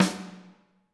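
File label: snare drum, Drums; Bob Clearmountain Drums I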